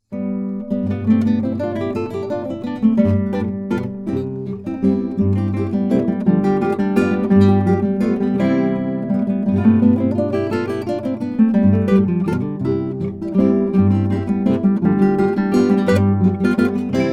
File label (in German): Gitarrentrio